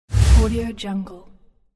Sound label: whoosh transition